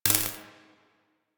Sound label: Laser Skin Printer Laser Stop; Ambience; Props; Warframe; Entrati